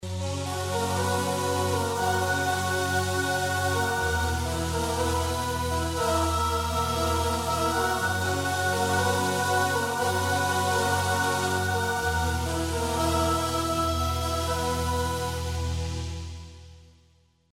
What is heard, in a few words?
choir mellow, yamaha tg500